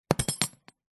industrial toolbox heavy duty plastic metal latch open; zapsplat